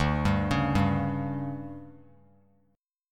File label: D Major 7th Suspended 4th, chord; DM7sus4; arpeggiated; JGuitar